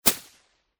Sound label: knife slice quick transition